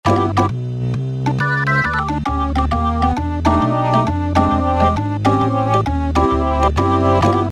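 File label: B-3 Leakage keys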